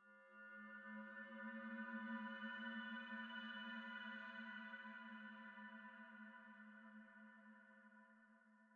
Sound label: Env Field Day Cave